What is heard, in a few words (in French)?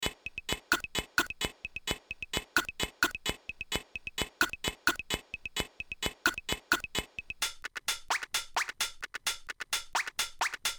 combinaison de percussions; Loop Mania Sampler Pack